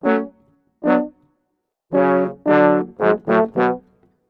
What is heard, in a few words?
110 brasses, Orchestral arrangements, F#min; PowerFX - Downtown Orchestra